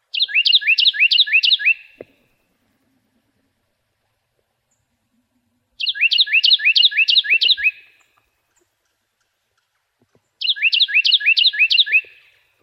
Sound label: Wrens; CAWR; deviche